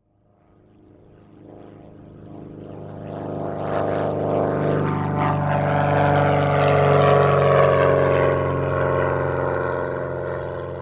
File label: plane; World of Tanks Blitz